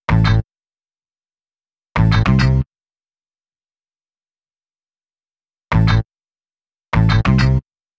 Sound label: Bass, Hip-Hop